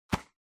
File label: Sensu Open